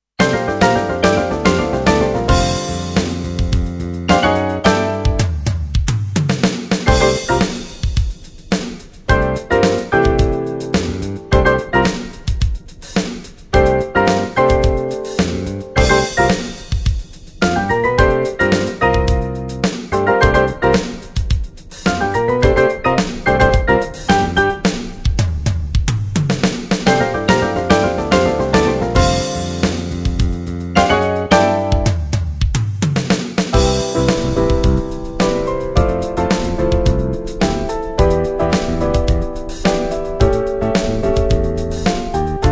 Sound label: Drum Explosion